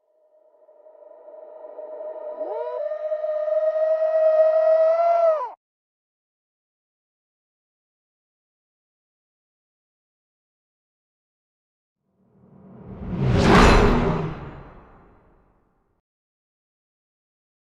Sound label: dark darkness